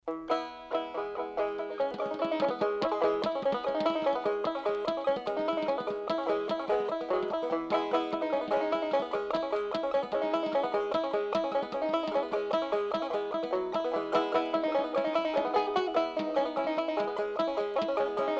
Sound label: banging breakdown, banjo clawhammer